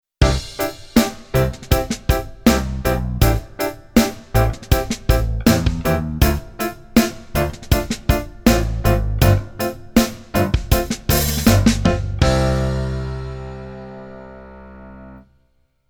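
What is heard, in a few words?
keyboard piano pops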